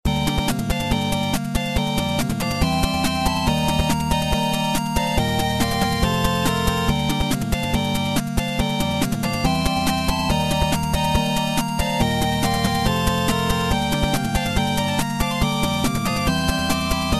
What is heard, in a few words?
bgm action